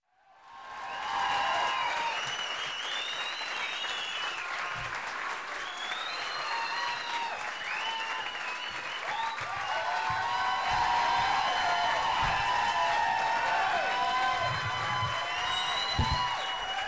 Viewers Cheering and Whistling

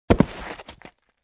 Boxes being piled

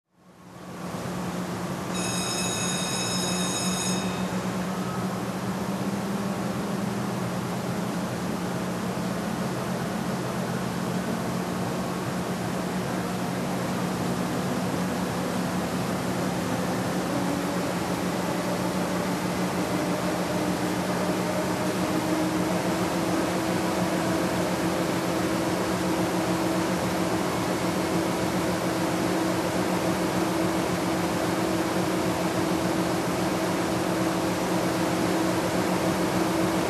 Exhaust Machine running

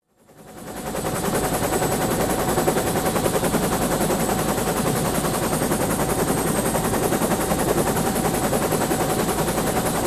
Helicopter flight from passenger seat

